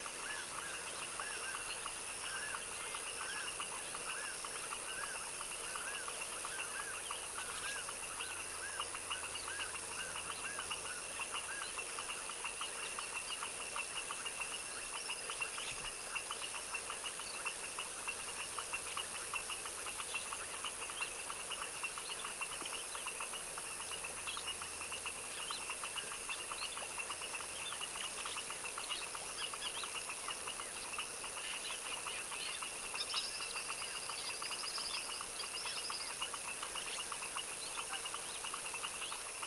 tropical wetland swamp evening foaming frog dusk chorus; sonniss gdc